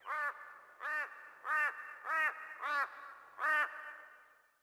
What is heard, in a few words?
sting crow; Rust